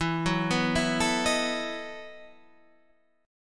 E Major 7th Suspended 2nd Suspended 4th, Guitar, Half Step Down